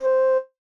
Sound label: Flute; Super Mario Bros. Remastered